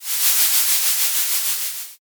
torch

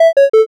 picosad

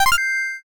rank up

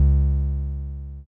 bass

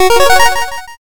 power up

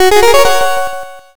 level up

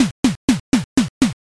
footstep run

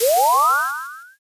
firework launch

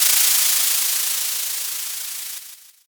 sparkler

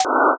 wallet

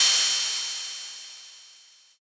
crash cymbal

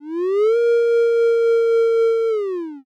wolf howl

